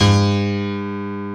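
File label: dance piano G1; Best Service Advanced Media Trax Modern Composer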